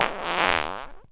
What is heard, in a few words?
fart medium squeaky; Farts